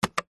foley cash register till digital button press single; zapsplat